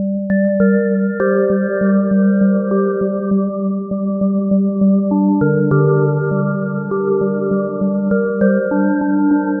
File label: Musical Loop 100 Bell Synth G Minor; Ghosthack